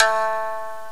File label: Shamisen; Ethnic; GMPlay